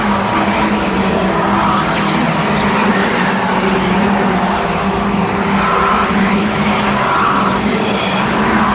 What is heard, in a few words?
alter unlocked captured; LoL; altars